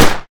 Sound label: micro smg; Weapons; EK modern; Barotrauma